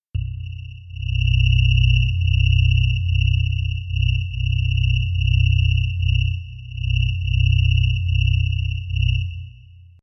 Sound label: high and low frequency drone